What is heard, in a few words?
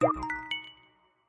fail